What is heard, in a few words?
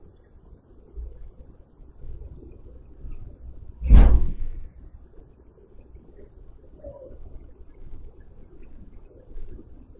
Static